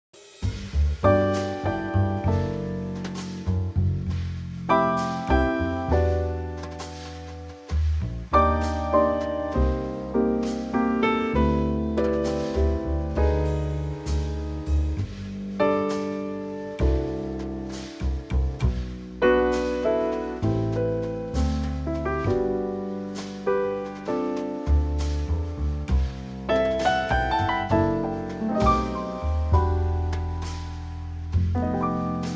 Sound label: G Trio, christmas